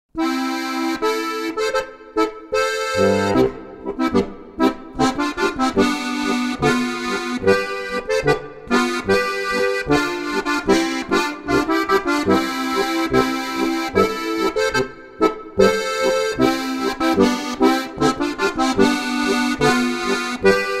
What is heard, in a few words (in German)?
boarischer